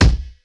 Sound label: KICK, Hip Hop